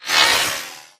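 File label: Drone Movement Turn; Titanfall 2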